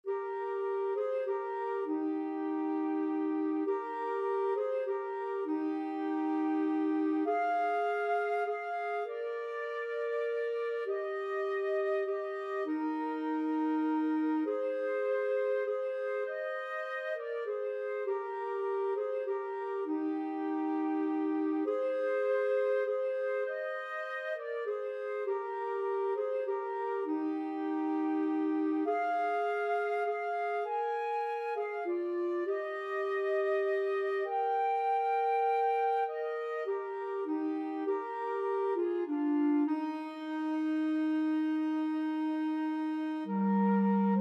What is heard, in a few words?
silent night, flute clarinet duet